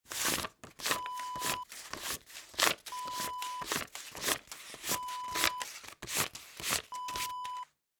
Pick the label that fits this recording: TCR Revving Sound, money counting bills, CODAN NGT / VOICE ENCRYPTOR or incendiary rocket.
money counting bills